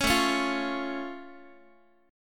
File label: A Augmented A down Guitar; A+ strummed; 16,17,16,15,x,x